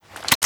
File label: sa80 reload lift, foley